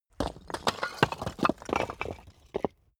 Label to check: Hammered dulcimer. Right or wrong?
Wrong